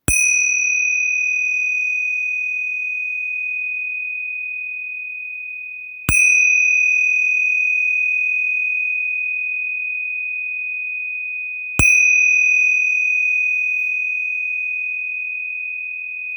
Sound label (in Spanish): Mi re 69cm 249g, cuencos tibetanos